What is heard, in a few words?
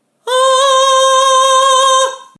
head voice